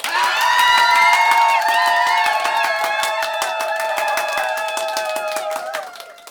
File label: cheer, small cheer; 1000fx